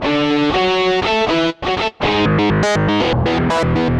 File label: Guitar on LSD Loop